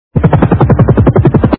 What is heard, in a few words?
hover